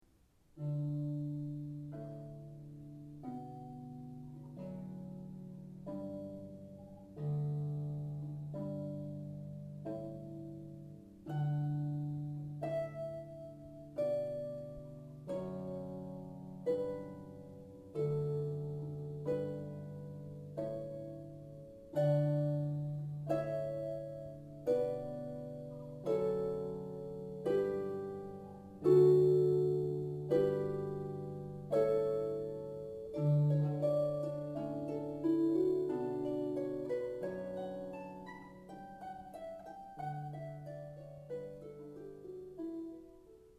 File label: solo harp canon; pachelbel